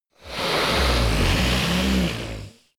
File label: monster snore breath low; comedy-cartoon; soundpool